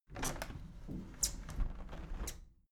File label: window handle plastic squeak close tilt soft; objekte; fenster